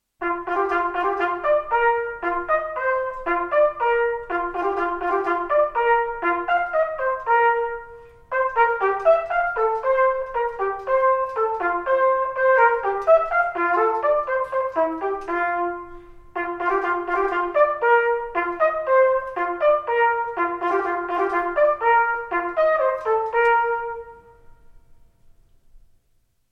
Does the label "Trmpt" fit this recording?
Yes